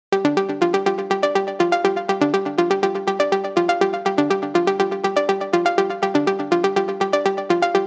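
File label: 122 BPM Arps A major G minor Tonic G; arp essentials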